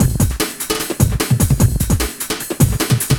LOOP, Eurotech; Sounds Good

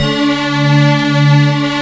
BELL ORCH

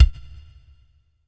BD, BASS DRUMS; EdgeSounds

